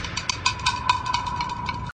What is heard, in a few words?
Rattle Snake; Shady